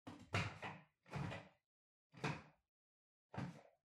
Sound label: Washer Front Load Far Filter Lower Remove